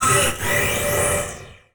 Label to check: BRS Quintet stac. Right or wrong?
Wrong